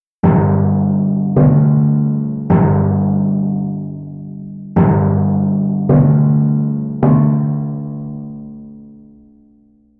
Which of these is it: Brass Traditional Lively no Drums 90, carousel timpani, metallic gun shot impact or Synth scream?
carousel timpani